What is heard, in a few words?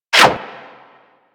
Bullet Parry Metal; Warframe; Bullet Parries